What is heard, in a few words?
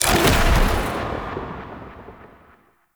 Lightning Spawn; Enemies; Sentient; Sentient Movement